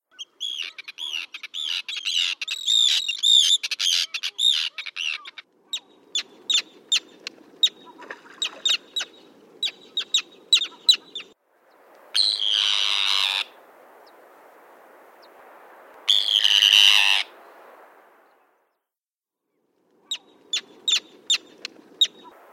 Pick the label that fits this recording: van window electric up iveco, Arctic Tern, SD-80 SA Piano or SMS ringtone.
Arctic Tern